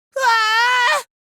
whaaa

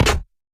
book close; stickler

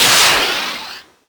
homing; sonic

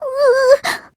uuuuuuuuuu

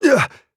ugh

